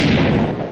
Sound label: minecraft explode sound